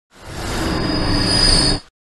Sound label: get echo